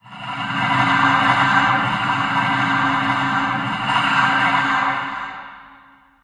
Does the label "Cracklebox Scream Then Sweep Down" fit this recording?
No